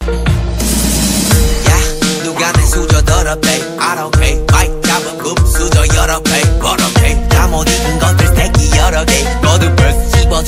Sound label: korean ringtone